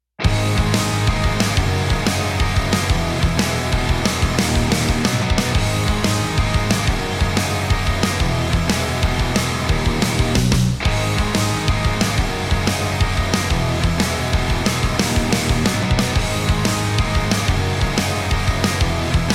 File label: Hard Rock Guitars On